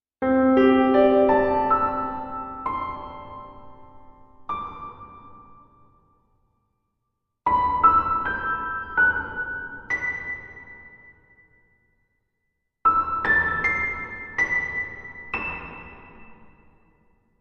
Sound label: pure space piano with ambience